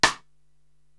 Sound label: Sidestick Dry, drum machines